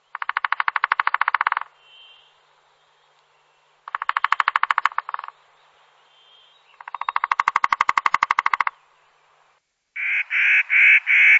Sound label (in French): pic tridactyle